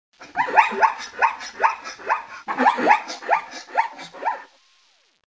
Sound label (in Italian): zebra raglio